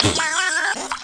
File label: Splat